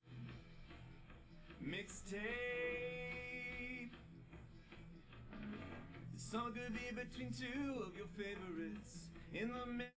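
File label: with drums, live rec; SiSEC11; underdetermined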